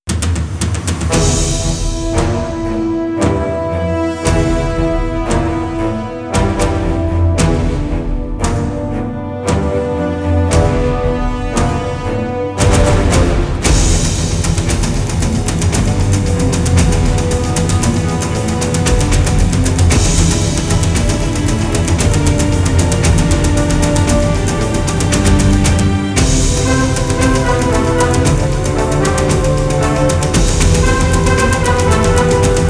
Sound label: synphonic tensions